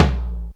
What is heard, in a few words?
Tom Low int